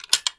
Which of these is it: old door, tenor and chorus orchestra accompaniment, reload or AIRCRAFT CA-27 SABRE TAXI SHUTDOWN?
reload